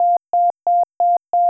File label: Car Warnings; oviatt